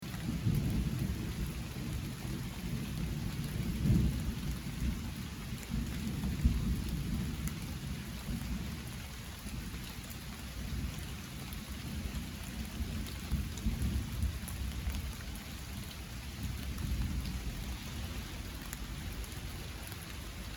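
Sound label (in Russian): звук дождя летнего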